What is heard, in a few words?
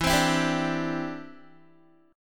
E Minor 7th Sharp 5th, Guitar; strummed; 12,15,14,12,14,x; 4E,4A,5D,5G,5C,6F; Em7#5